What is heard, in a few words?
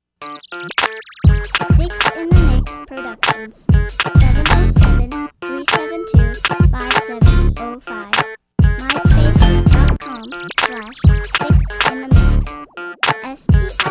rick funk2